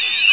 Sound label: siren; SINDEMO; environment